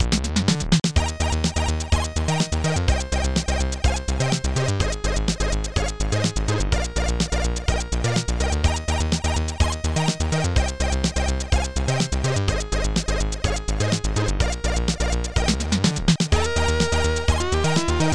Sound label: SCALES